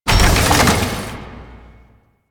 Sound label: Customization UI Open; Veilbreaker; Kahl